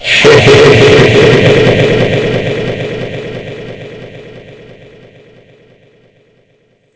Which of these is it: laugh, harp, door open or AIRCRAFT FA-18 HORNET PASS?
laugh